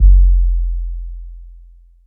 kick, Heartbeats

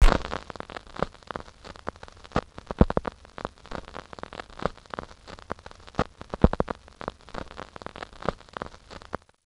crackles, Vinyl

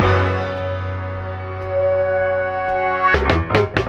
piano, Loops; Phonky

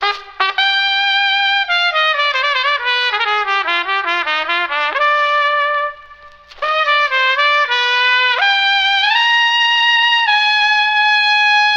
horns meandering uncut, Phonky loops

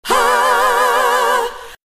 "ha" female sung; ooh ahh

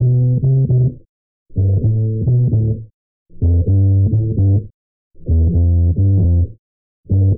bass, Loops Phonky

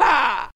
"ha", Voice

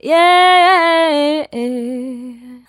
"yeah" sung

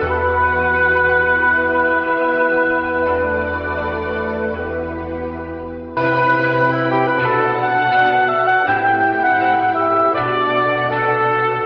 horns, Loops, Sad

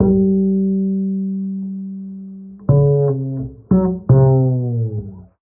bass

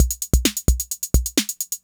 Drum loops, Electro

